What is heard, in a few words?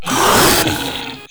Proto Infested Ancient Vocal Pain; Warframe